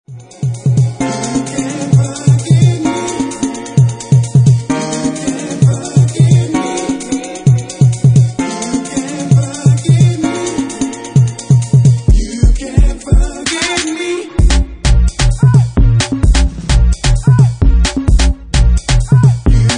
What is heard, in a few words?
UK Funky